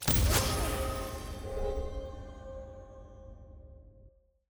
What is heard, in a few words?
ui button click